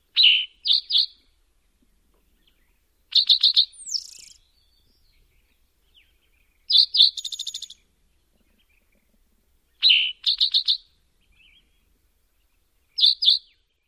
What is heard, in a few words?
songs